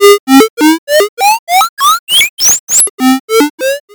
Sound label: blips